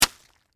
Stab Cabbage Head, Hit, Vegetable; Texture; Food Sound Effects

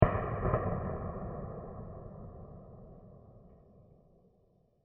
Big Explosion Followed By A Small One; Explosions; Crafted Signals

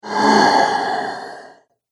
Zombie Death Breath; horror; zomby; Zombie Death Breath 04; Zomby Sound Effects

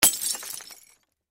Crash Glass Cologne Bottle Break Drop Crash; Blastwave

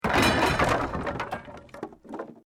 Wood Collapse

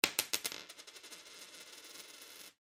Foley Coin Penny Drop On Counter Long; Money (Sound Effects:Objects)

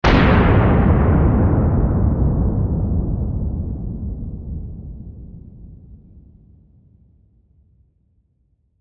Explosion Demolition Blast Trail Off; Military; Warfare; Blastwave

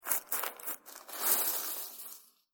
Warehouse Searching Through Garbage; Garbage Sound Effects; Foley Sound Effect; Andrew Fleming

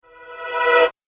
Riser Brief And Bold; Rise And Dive Sound Effects

